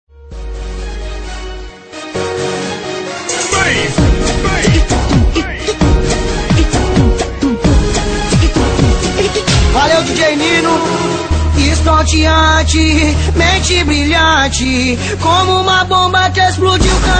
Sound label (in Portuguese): funk batidas; sp